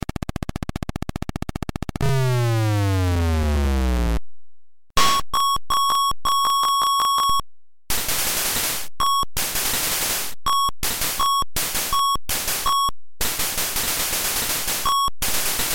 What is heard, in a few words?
BGM; 1942